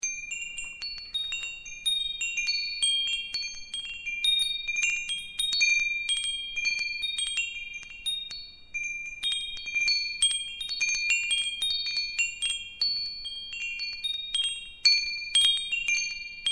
metal wind chimes

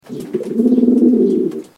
pigeon coo

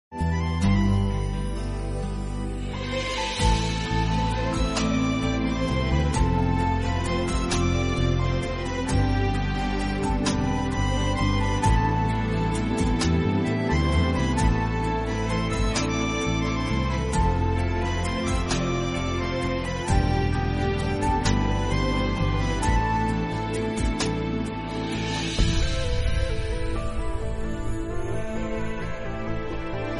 Serial Background Music; Nagin 3; Colors

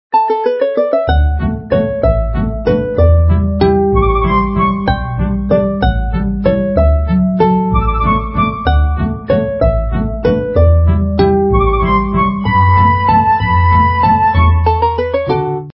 spring ringtone huawei

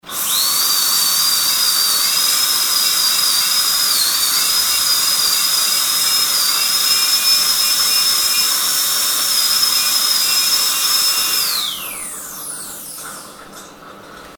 dentist drill sound

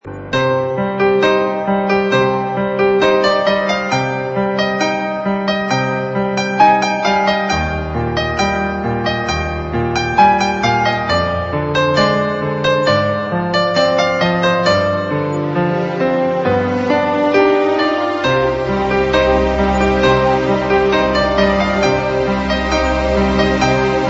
hungarian sonata, piano sonata; mozart

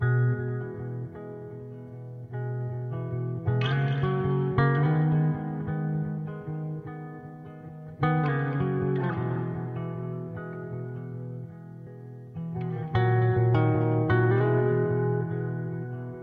guitar solo

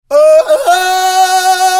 tarzan appealling, tarzan sound

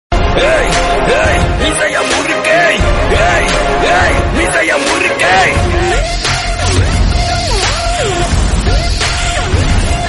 BGM; Meesaya Murukku

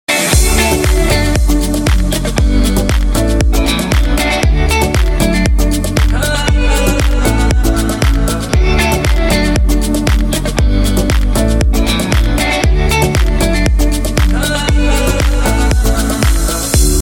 remix

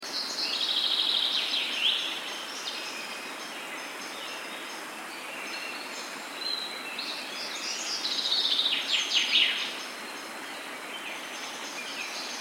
nature sounds

